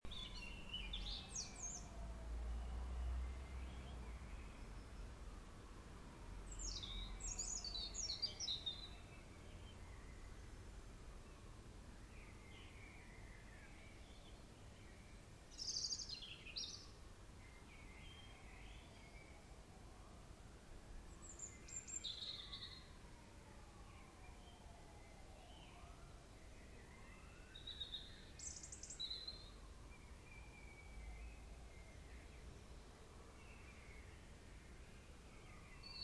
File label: 4am birdsong hackney